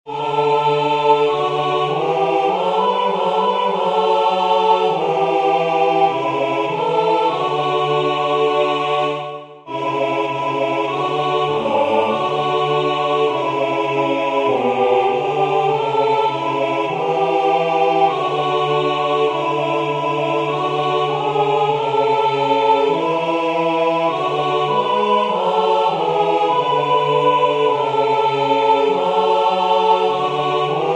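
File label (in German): Kling Glöckchen klingelingeling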